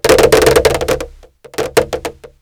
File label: wood